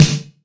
tight low end snare drum sample D key, snare drum tuned to the D note